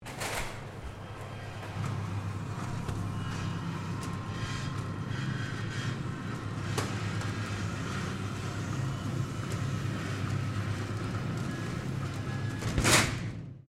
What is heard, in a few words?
Door rollerdoor small supermarket closing, Small internal roller door; Miscellaneous Doors